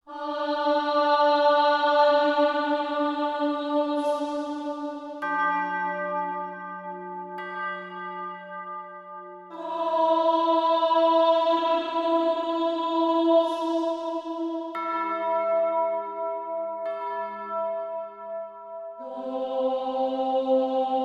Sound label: Sacred Chant Female With Bells; Legends of Ellaria